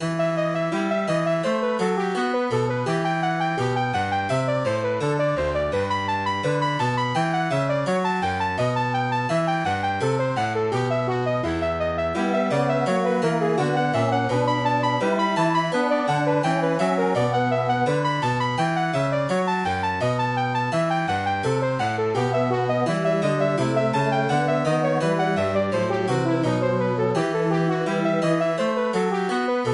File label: MIDI